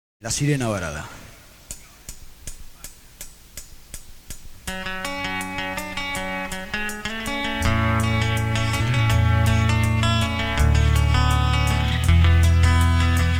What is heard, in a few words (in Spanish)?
Versión acústica